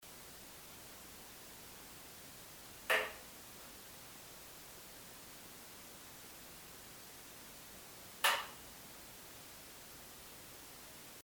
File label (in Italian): Due colpi; Castelli Romeo Giulietta